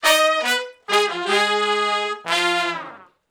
Shuffle Blues Riff (Ab) unison, Horncraft for R&B Sections